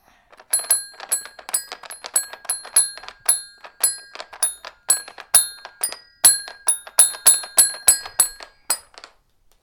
kid playing with a toy xylophone, A cute toddler playing with his toy xylophone